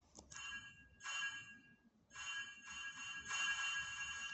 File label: caution ping